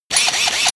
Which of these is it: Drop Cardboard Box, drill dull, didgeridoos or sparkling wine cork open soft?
drill dull